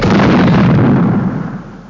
explosion

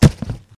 tux hit tree; tuxracer

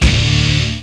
Turn Sound Off Sound; Widget Workshop; A Mad Scientist's Laboratory (1996); Maxis

